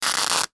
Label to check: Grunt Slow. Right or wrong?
Wrong